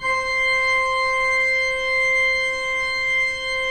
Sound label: 3D Pipe Mix Celeste, 3D Organs